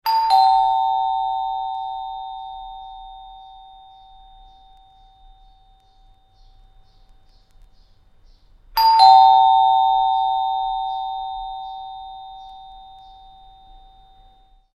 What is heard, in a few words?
Door Bell Chimes - Ding dong - nice acoustics; Doorbells; Doors; Tom Hutchings